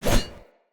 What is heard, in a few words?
Spear Whoosh; Weapons; Cleansed Spear